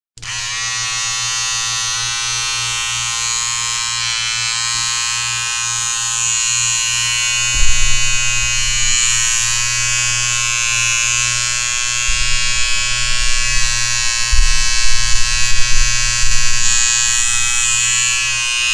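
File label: sound of razor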